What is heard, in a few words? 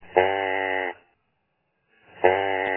doe bleat